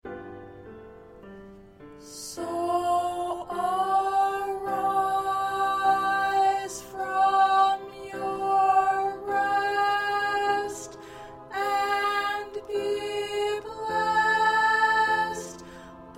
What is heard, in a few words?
Alto, choir parts